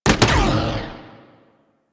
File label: Spear Gun Shot, Weapons; Warframe; Corpus